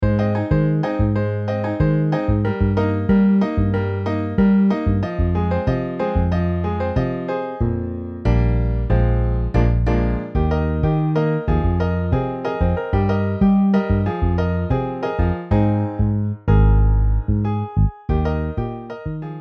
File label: Piano Bass MIDI